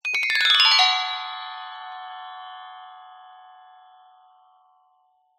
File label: musical instrument gender small gamelan glissando downward